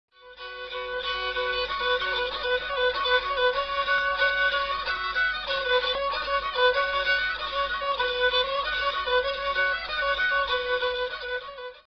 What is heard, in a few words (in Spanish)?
rabel; tradiberia